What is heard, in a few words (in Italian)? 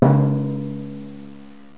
TIMPANO; Timpani